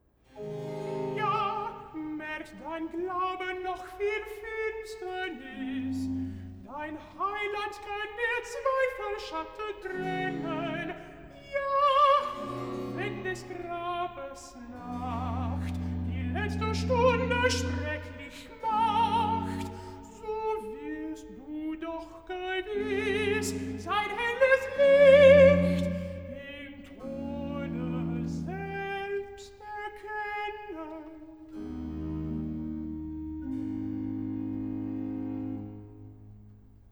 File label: Recitative (countertenor); Ja, merkt dein Glaube noch viel Finsternis; Bach; Gardiner; BWV 83